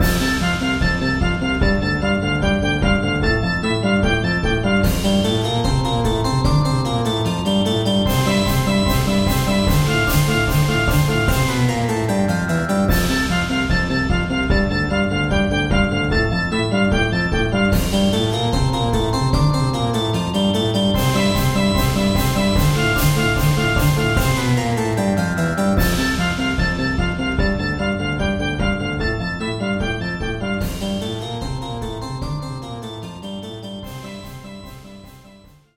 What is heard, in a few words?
Speed Up; DinerLand; Cooking Dash